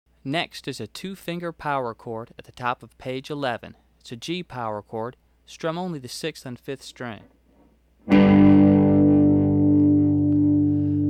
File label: 2 Finger Power Chord